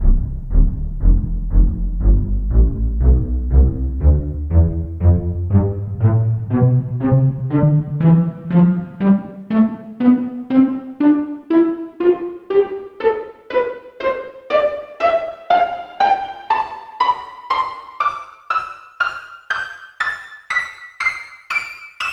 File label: pizzicato section; tonleiter